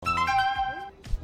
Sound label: message sound